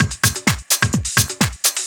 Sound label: Latin Loop 128 BPM